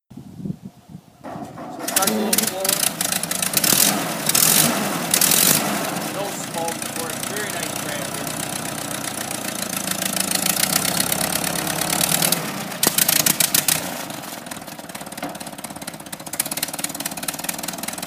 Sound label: Tractor, antique tractor